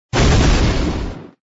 death sound

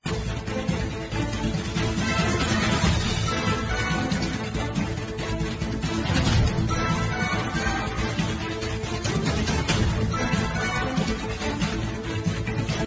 boss Bgm

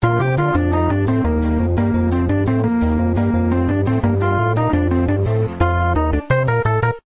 win

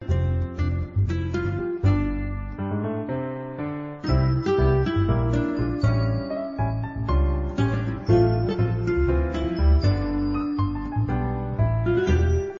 bgm game

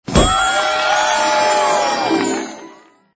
big win gold

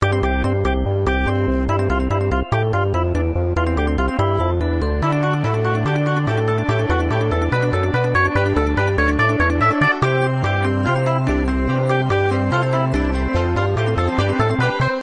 win

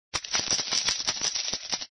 chip get

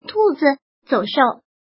Rabbit

